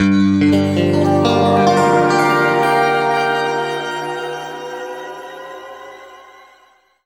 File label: G P-S, guitar chords; East West; Steve Stevens Guitar Samples Collection